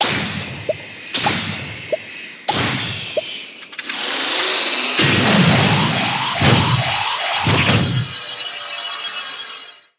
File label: emote jade towel gloss sfx loop; actmod; fortnite